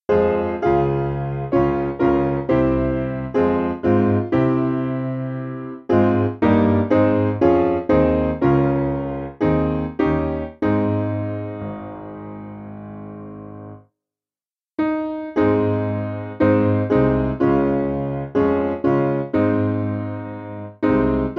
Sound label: S Piano